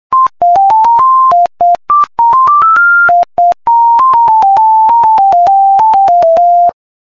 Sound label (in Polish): classical!, melodie, ericsson; dzwonki